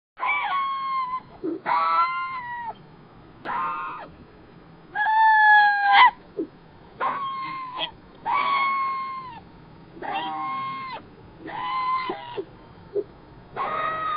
circus sea li, circus; stefano

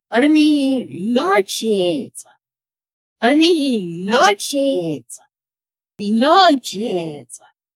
many high pitched soft vo; freedom

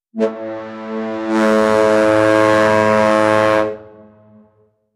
cruise ship air horn; nautical

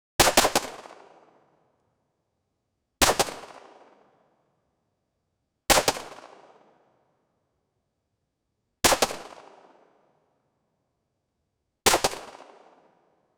AK-12 shoot sound, automatic

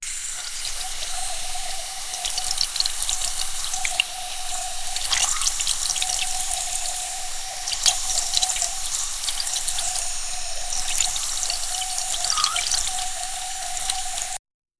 sound of cleaning

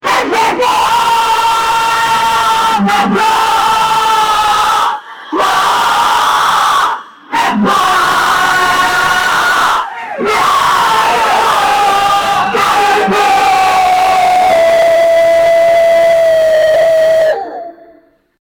ooh wow, awe; empire

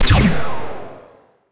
clean and futu, gravity gun

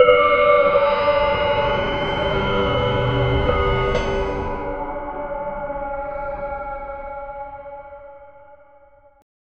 draingang style, riser

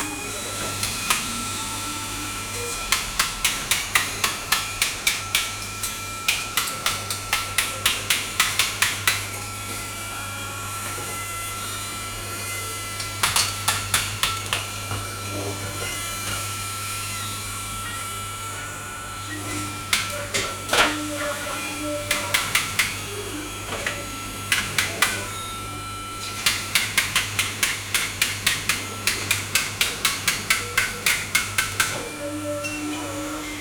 hairdressing salon busy

